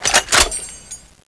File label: m249 slide back, weapons